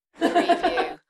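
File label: Lady Laugh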